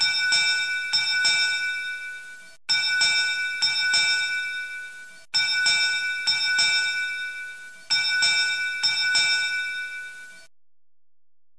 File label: bell